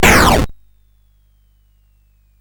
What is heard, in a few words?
Frantic, CSG8580R5